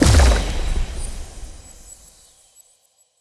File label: Stomp Impact; Powers; Fey; Champion